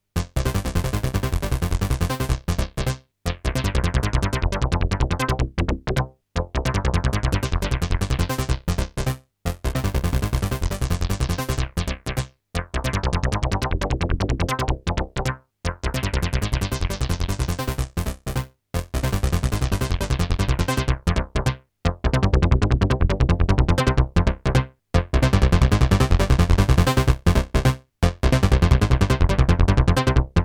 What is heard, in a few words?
JX10 filter bass